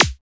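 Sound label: SKWEEK SNARE; Drums In Your FACE!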